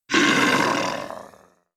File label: monster